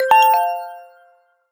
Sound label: Signal; Motorola Droid-Razr-M